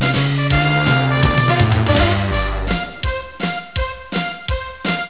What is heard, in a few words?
show tune